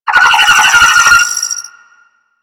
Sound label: Space Drone Attack F; Warframe; Corpus Space Drone